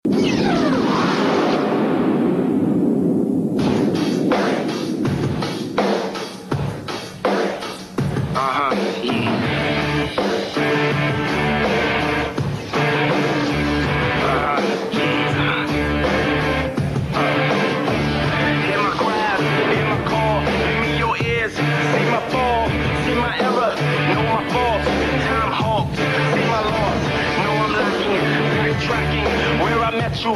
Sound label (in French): Générique; Téléfoot TF1 2000